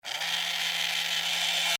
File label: Scifi Servo Mechanical Robot Small Motor Toy